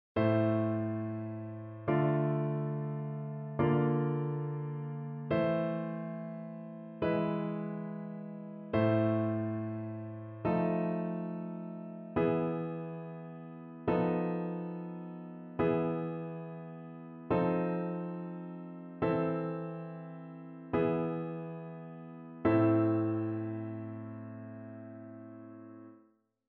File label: Progression with N6 It6 Fr6 and Ger6 Chords